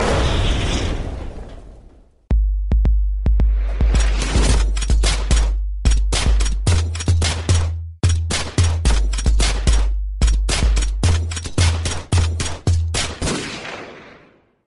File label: Gunshot Rap Sms